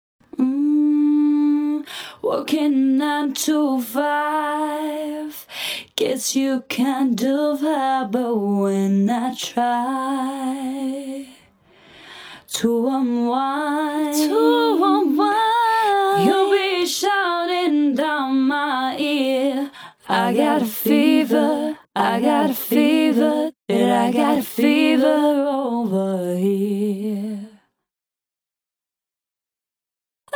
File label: fever vocal